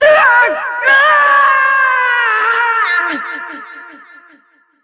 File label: Hit Slow Motion; getting hit (slow-motion scene, her force-field broken); Impa; Skyward Sword